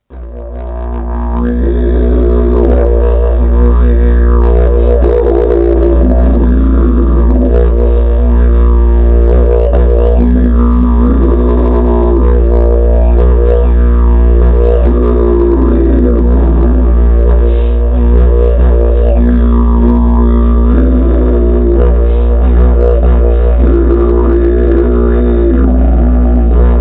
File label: Didgeridoo